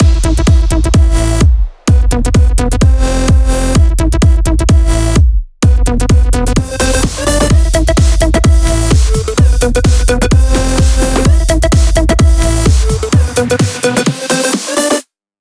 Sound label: 128BPM Mix; Vengeance Samples; Streetfight Kit